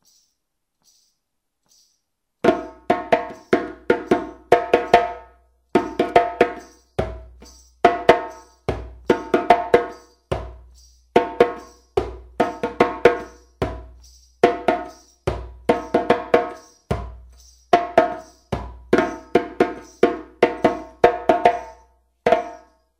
Lamban, djembe

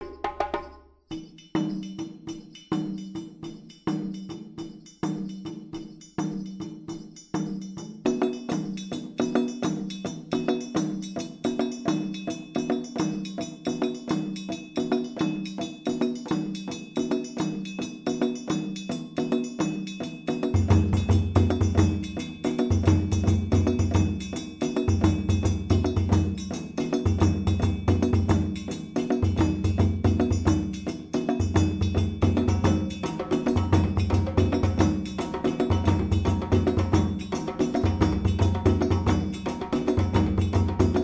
Kassa Opbouw, djembe

